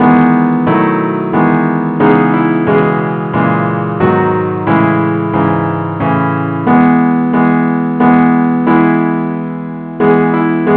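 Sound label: advance accompaniment key E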